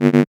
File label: System Basic Unselectable